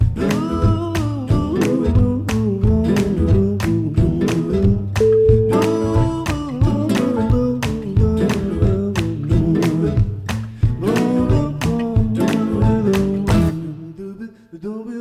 vocal blues

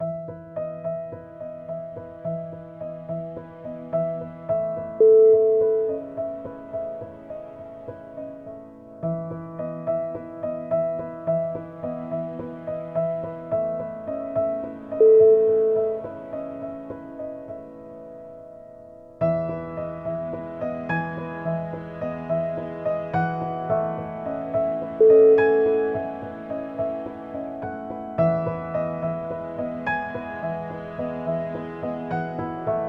emotional storytelling

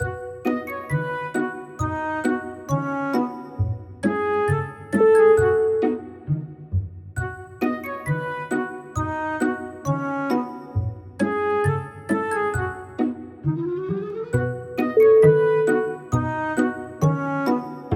quirky sly and funny

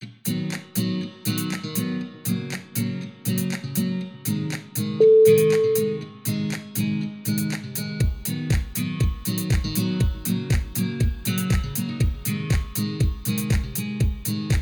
milky way house guitar

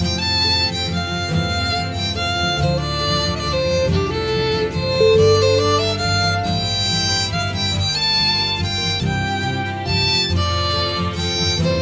irish fairytale

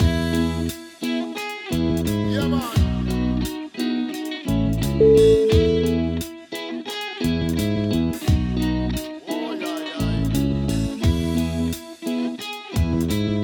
reggae

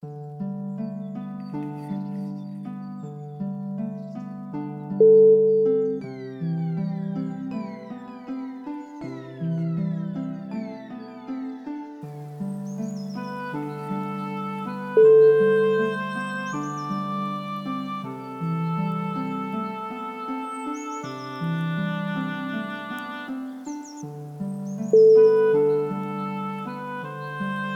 oboe meditation

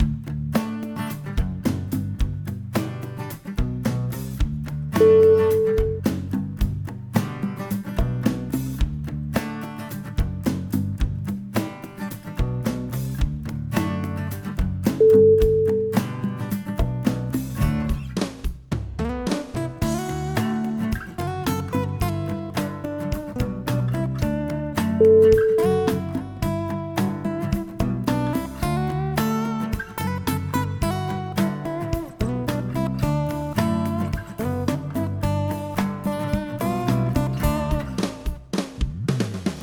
acoustic happiness

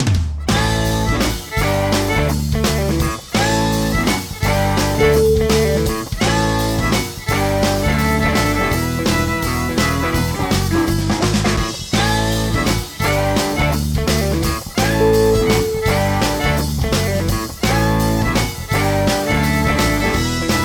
happy country rocking

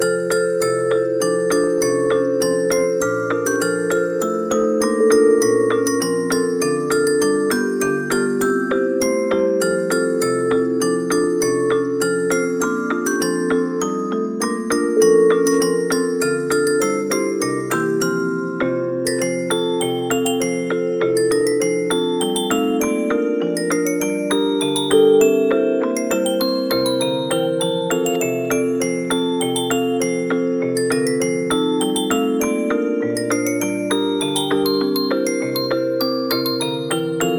jingle bells reprise

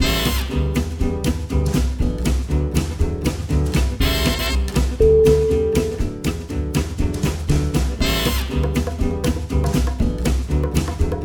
this quirky jazz

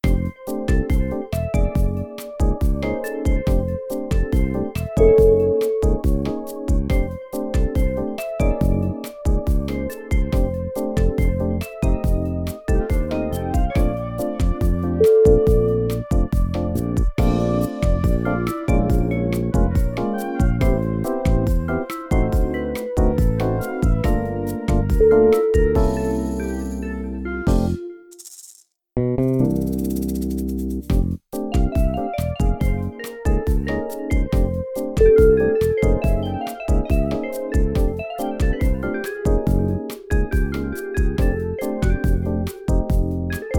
family bossa

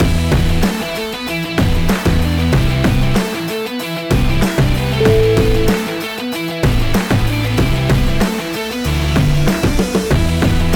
sports opener

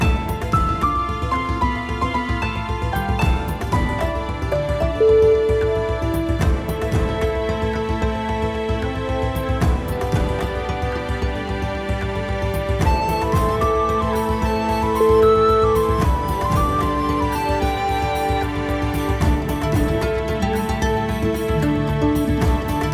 medieval adventure

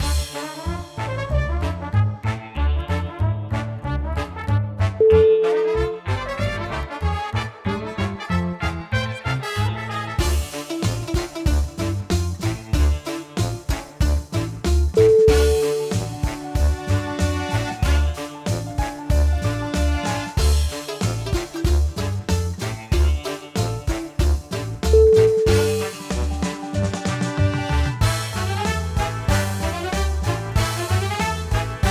barbecue championship

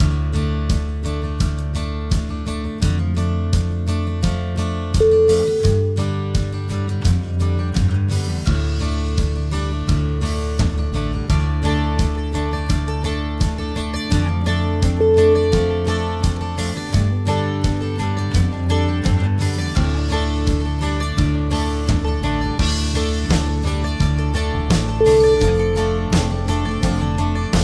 country southern guitars

